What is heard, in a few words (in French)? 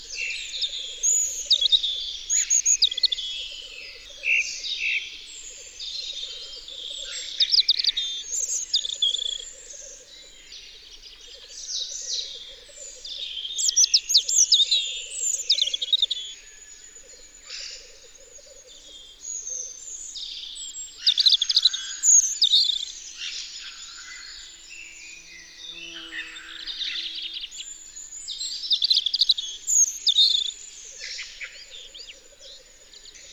Rougegorge familier, Concerts naturels de l'Isère; Studio Les Trois Becs